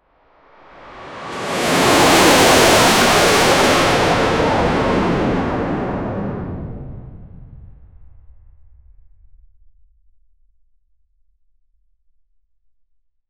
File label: realistic sound of a fast, drones